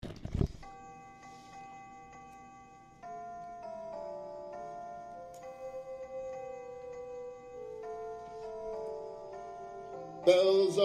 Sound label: Bells Are Ringing Round